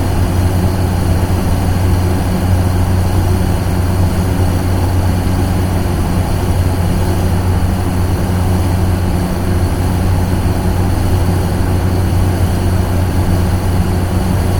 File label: boiler room